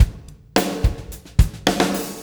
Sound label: PULL FBAR, Backbeat; Spectrasonics